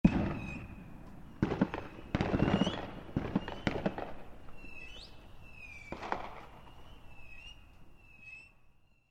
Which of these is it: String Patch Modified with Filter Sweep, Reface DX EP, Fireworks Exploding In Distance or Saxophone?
Fireworks Exploding In Distance